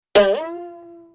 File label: cartoon twang